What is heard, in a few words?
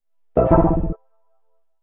HOUSE FX 125